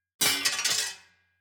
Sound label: sword break; player